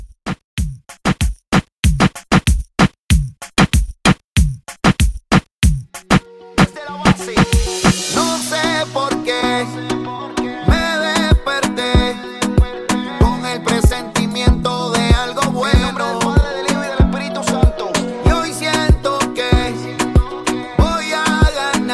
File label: Reggaeton 95Bpm